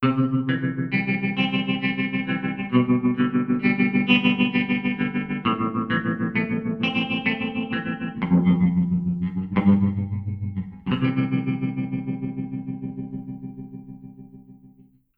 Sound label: Trem low settings